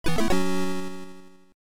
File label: Title AY; Hawk Storm